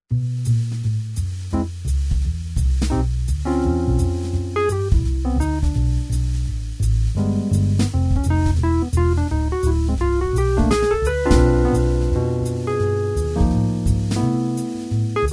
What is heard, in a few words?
wurling